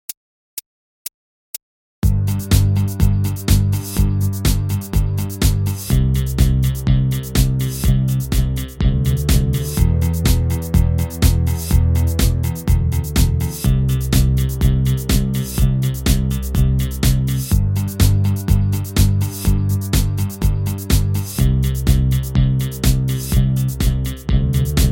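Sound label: BPM 124 G C E minor C, song jams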